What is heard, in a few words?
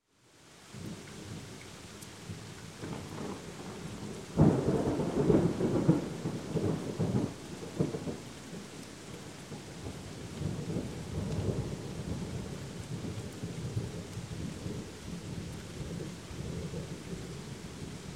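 Thunder Long Rumble, Rain In Building; Sound Ideas 2000 Series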